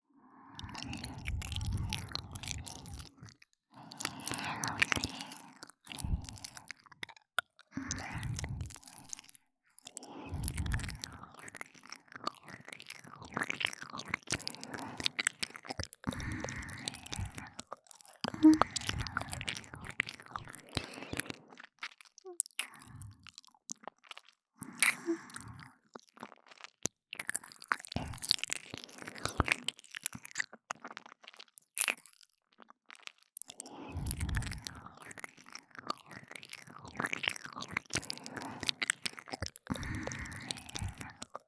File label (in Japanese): 耳舐め 左; イリス耳舐め5分